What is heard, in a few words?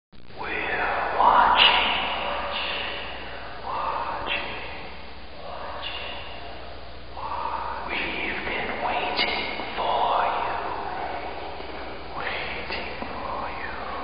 Ghost